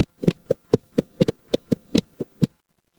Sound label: animal horse gallop